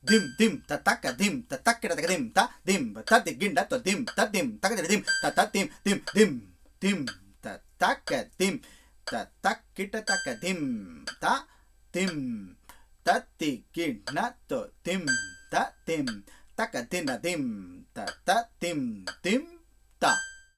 changing jathi 4 3 to 4 7

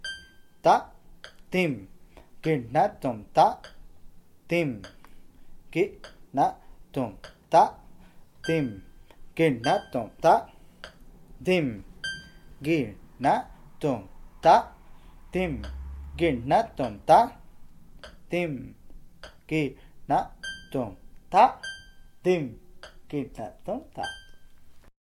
yati prastara

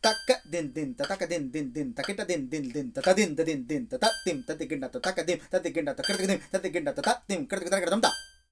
sankirna

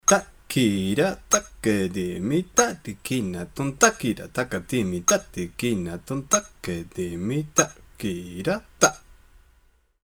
gatis beat

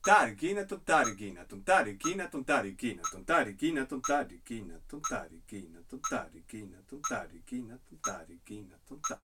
resolution nadai to gati

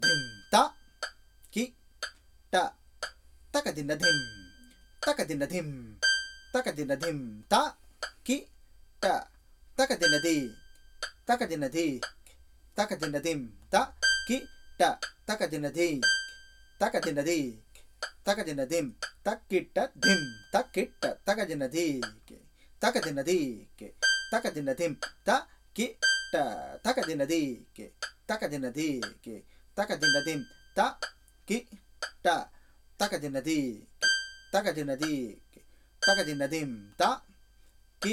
tala repeating phrase

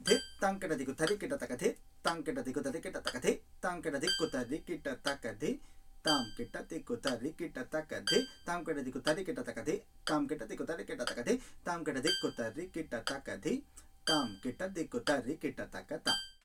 phrasing through gati changes khanda tisra

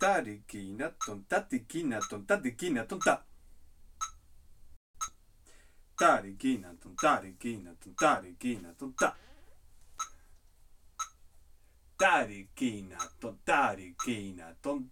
uttarangas tirmana 9 beats

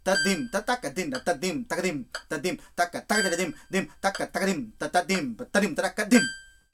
phrase chatusra nadai

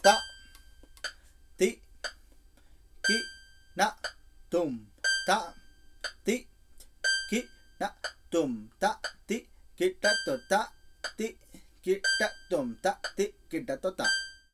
tala 7

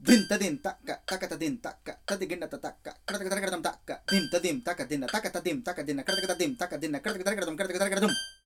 sankirna

